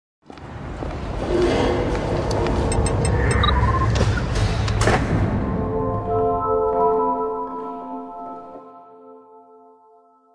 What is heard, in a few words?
startup